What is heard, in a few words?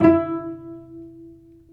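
strings cello pizzicato, dry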